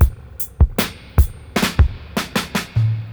78 fill FX, drum loops, dub; Drumdrops In Dub VOL-1

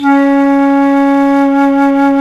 FLT C FLT, C Flt Brt; Roland LCDP04 Orchestral Winds; 90 s Sample CDs; C Flutes 3-8

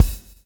swing

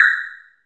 SPECTRUM PRC; TEK PERC2

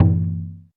Roland U220 Electric Set, Drum Machines

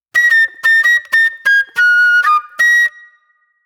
J-Funk Flute, Call And Response; Sonic Foundry (Sony Creative Software); Manhattan Flutes

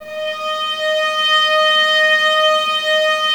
STR VAS D#, Violas, No Vib; Miroslav Vitous

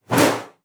BOW PERC, BASS FINGER; Xsample VOL-5

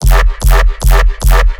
Late Night, Bass Loops, 150, F; Virtual Riot

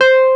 WURLITZER; E-MU Formula 4000 Series Vol. 3; Analog Odyssey; VK Instruments

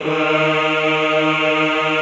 EHH D#2, Classical Choir; Best Service

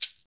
PRC ETHNIC PERC; Roland - LA Composer vol. 1

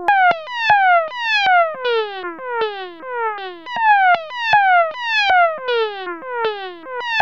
vibration; Zero-G Ambient 1; VIBRATIONS

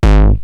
Roland TR-909 BD; BD Selection

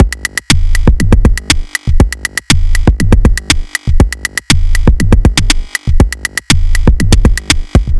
Lite 808 Drummer; Sonic Foundry; The Electronic Music Manuscript Collection; Drum Loop Structures

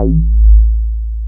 A-BASS, AN BASS; AKAI S-Series CD-ROM Sound Library VOL-7

